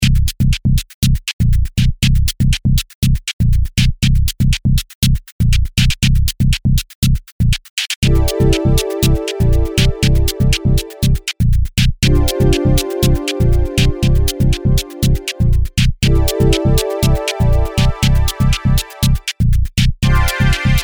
Ableton Operator easy

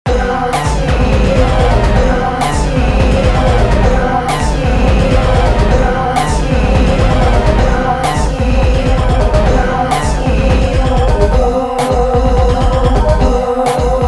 Sequential Prophet X; Rational Boys

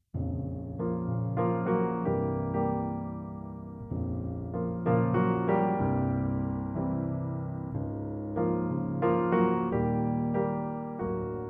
Clavia Nord Grand Velvet Grand

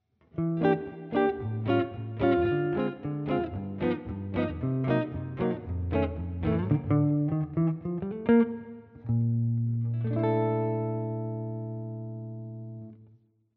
neck position chords

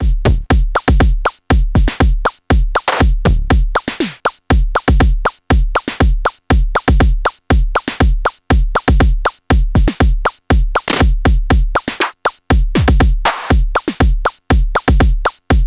Transient Plus Sample Select Beat; Future Retro

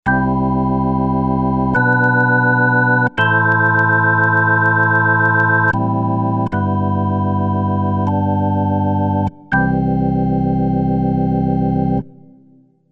Crumar Mojo Desktop; Jimmys Shack

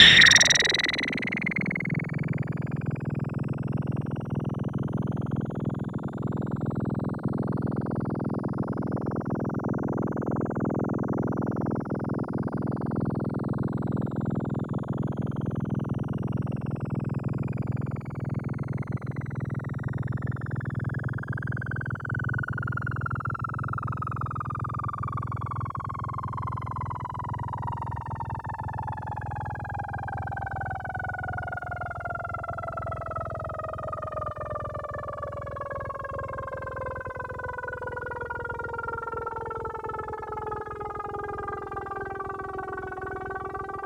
Hit Sweep

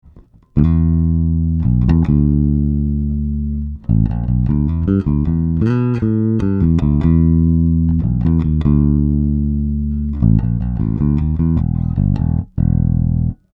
HB Block 800b Jbass LoMid

